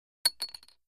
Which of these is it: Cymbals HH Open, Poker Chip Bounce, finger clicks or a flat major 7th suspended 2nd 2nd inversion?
Poker Chip Bounce